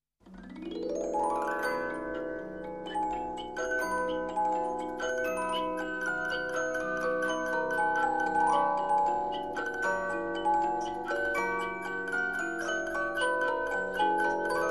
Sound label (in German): Musikdose mit Zither